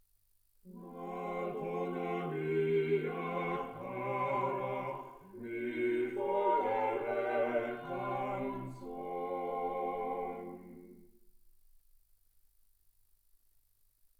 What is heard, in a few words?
quartet